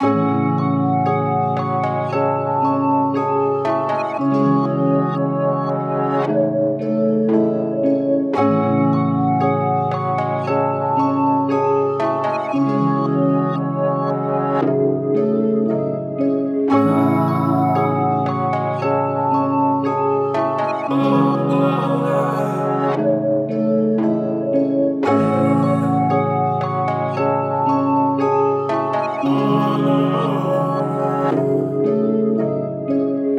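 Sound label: Asia C minor 115 BPM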